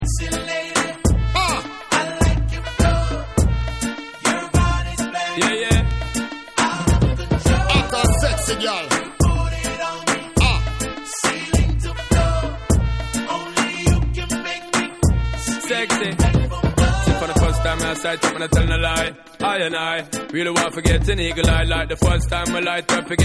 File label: Dancehall Version